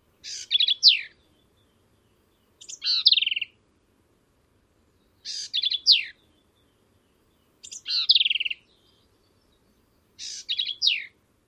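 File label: Black-capped Vireo song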